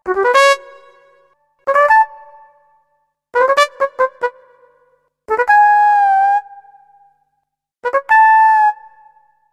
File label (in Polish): trabka